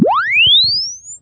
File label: Press Two Sides Chirp; Samsung 4 Recordings